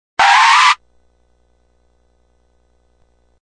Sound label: Car Skids, Sound effect of a single car skidding to a halt.; Race Cars; Vehicles